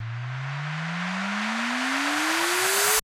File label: buildup, slots; garrysmod; blues-slots